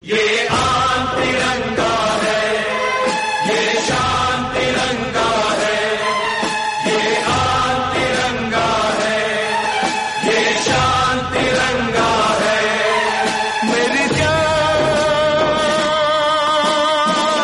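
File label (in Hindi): patriotic hindi; tiranga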